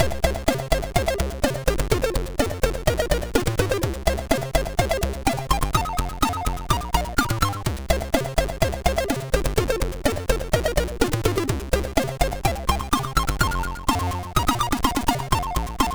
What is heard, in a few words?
Fuzzball, MOS6581R3